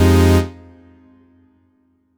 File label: stab buzza F, Noise; stabs